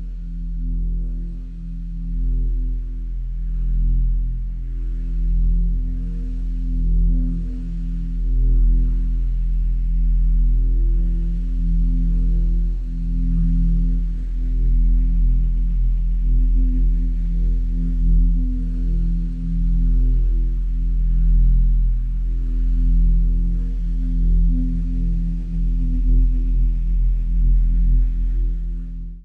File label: core